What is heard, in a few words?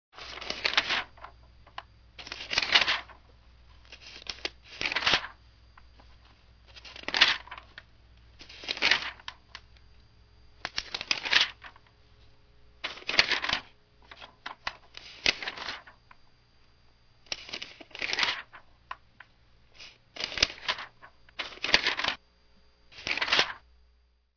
Thin pages